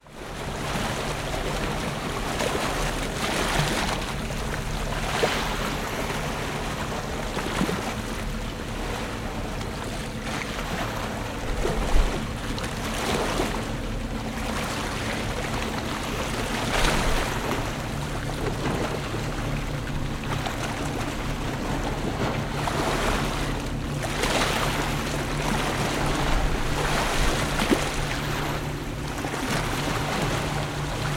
ocean waves med on stone slipway boats harbour in bg